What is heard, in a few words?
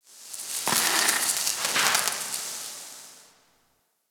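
Ice Sizzle Random Ambience; Warframe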